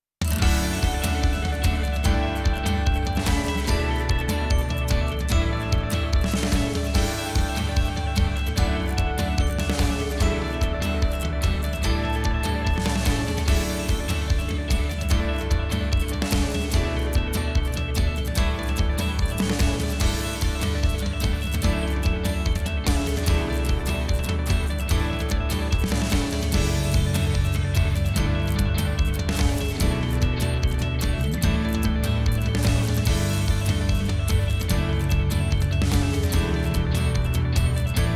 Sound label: inst